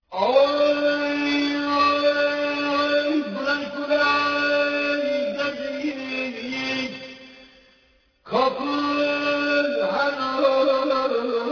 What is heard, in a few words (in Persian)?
AVAZ V SAZ